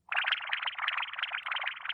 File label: encouraging emote; animal crossing